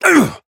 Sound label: pain big